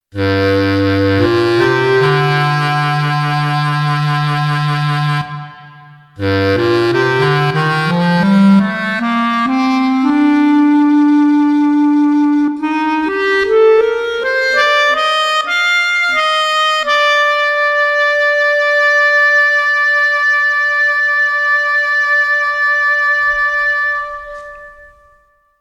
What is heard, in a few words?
Bass Clarinet